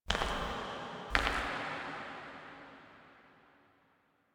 Steps Far, Foley; Apostasy; Ballas

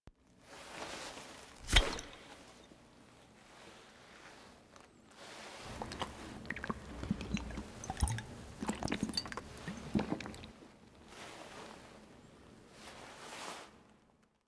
Foley Drink; The War Within; Operator; Kuva

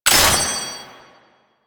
Sniper Last Shot; DE; Warframe; Warframes; Frumentarius; ExaltedSniper

